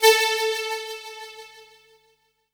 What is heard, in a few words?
lead syn, Techno Trance